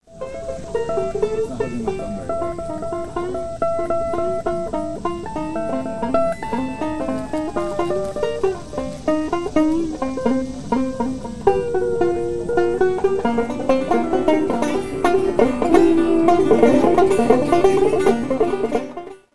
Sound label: Banjo Slide Acid Swell; Banjo, Slide, Intense Swell